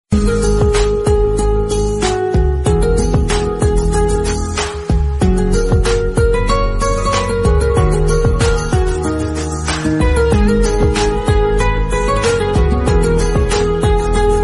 background music instrumental tone ringtone, bollywood ringtones